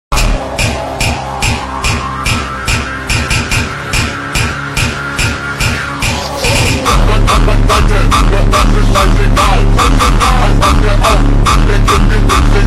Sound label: slowed reverb